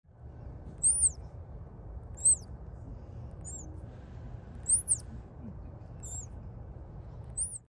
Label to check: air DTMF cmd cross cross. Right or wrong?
Wrong